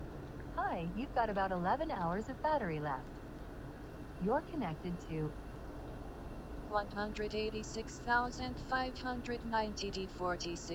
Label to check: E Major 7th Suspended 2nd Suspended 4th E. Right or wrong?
Wrong